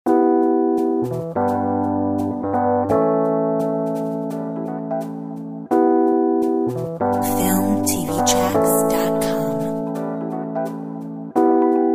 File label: Rising Rhodes